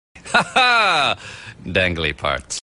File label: ha ha